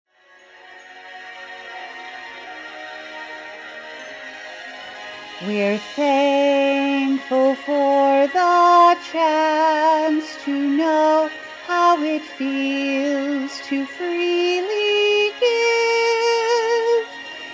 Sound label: vocals